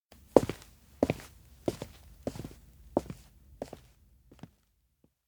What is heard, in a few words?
steps outdoor observatory leave; awayuki; anemoi